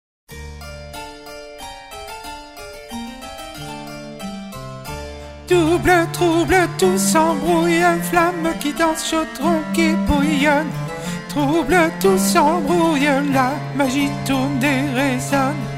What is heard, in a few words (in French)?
Voix 3